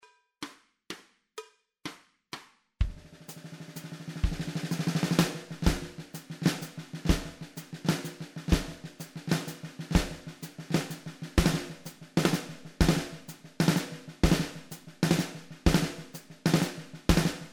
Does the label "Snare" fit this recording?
Yes